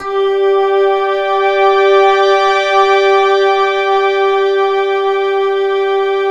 G3 string; NorthStar - The Wizard VOL-2; STRING THING

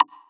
Wood, Block Stick; Equipped Music; Loungin House

